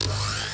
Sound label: droid servo